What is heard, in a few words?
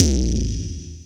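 bass drum, right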